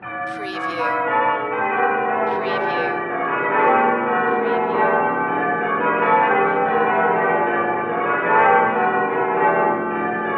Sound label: Church Bells Westminister Abbey Peeling